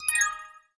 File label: fc green, flight control; ships; CosmicRage